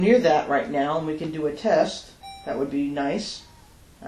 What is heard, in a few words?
hmmmm